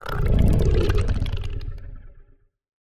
Alien Distant, Monster Sounds; TheDeclineOfWarriors; Monster Sounds Volume II

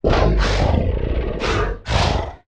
Canine Breath, Monster Sounds; The Decline Of Warriors; Monster Sounds Volume II

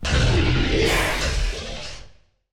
Digital Creature Processed Chorus, monster sounds; The Decline Of Warriors; Monster Sounds Volume II

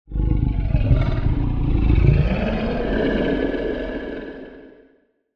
Feline Roar With Breath Tail, Monster Sounds; The Decline Of Warriors; Monster Sounds Volume II

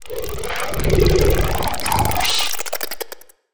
Alien Passying by, Monster Sounds; TheDeclineOfWarriors; Monster Sounds Volume II

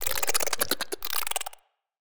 Alien Tiny, Monster Sounds; The Decline Of Warriors; Monster Sounds Volume II

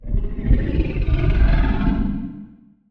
Feline Regular Roar, Monster Sounds; TheDeclineOfWarriors; Monster Sounds Volume II

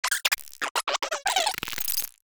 Tiny Creature Robotic, Monster Sounds; TheDeclineOfWarriors; Monster Sounds Volume II

